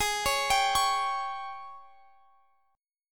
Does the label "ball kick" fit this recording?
No